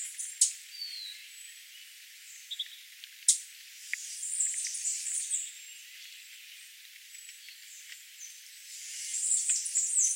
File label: Elfins Wood Warbler call; PuertoRico